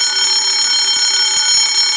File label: alarm; Mafie 1